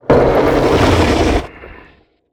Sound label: SPINELING GIANT idle